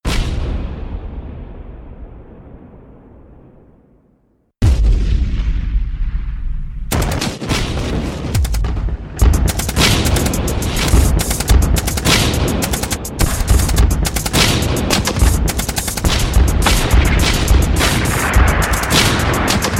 explosion

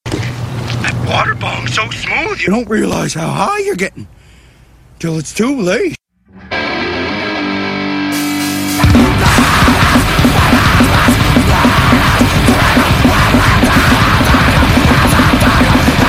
dance techno

